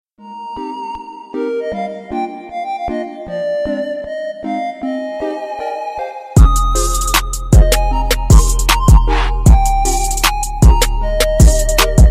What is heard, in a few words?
trap remix